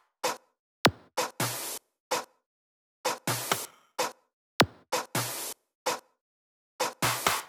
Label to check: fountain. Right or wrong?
Wrong